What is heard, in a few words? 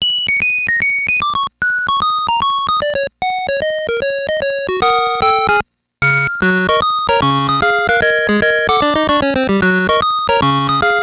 tandy, pc